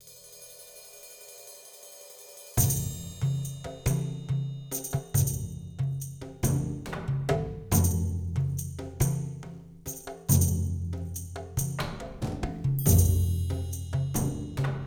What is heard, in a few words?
Music Resurrection Percussion; 7 Mages